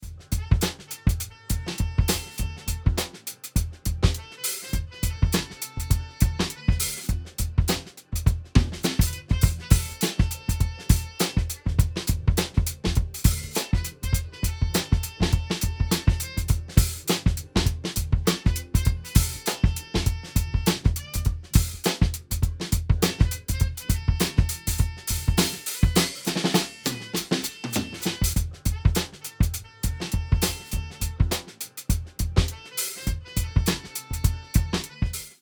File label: Ableton Drum Buss Drive100